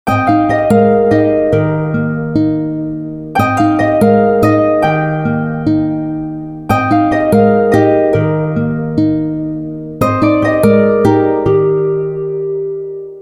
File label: Pure Guitar Harmony, ringtones; Alcatel 5049W T-Mobile REVVL